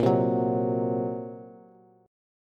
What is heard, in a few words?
Bb Suspended 2nd Sharp 5th, Bass; Bbsus2#5; strummed; x,13,10,11; JGuitar